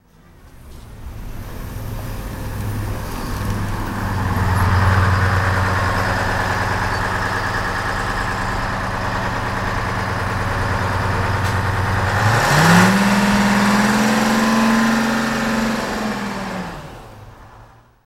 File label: Bus Drives Stp Idles A